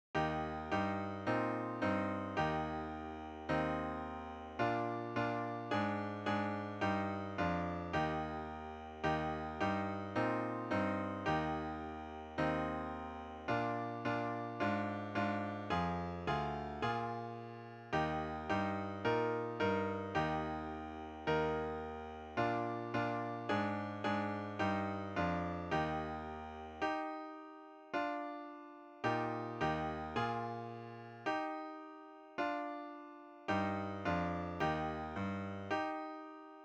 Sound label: Solo 6 Eb brs and Clar; Solo Six; Eb Brass &Eb Clarinet; stage 4; Development Band Tuition